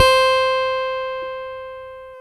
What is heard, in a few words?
BS CHAPST, Chapmn Stick